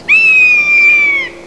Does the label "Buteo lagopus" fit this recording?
Yes